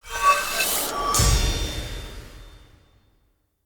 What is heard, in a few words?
UI Weapon Level Up; Hud UI